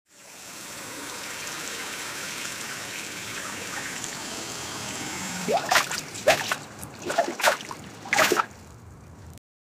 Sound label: car splashing water; driving